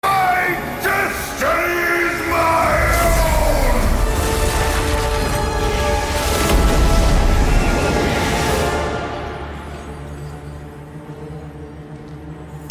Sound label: Rejection Note